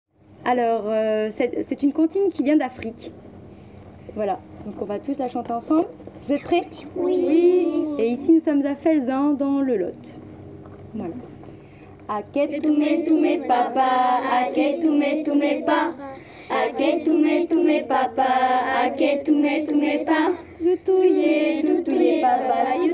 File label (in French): comptine africaine mozambique